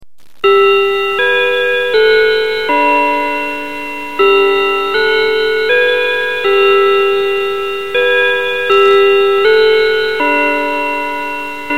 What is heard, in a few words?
westminster quartz, wall clocks; simplywallclocks